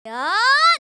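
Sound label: MON ATTACK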